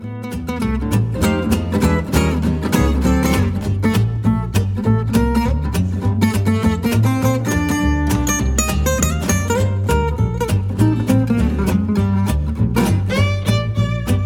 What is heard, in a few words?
Jingle Bells